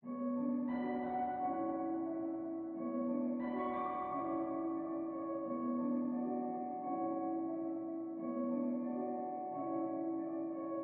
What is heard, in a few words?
Reverb, 177 BPM B Min; Loop Stems; Cymatics; 2022 Melody Collection Platinum Expansion - Pt 2; Origami